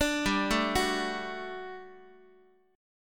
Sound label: G Major 7th Suspended 2nd G down Guitar; GM7sus2 arpeggiated; x,x,5,5,1,5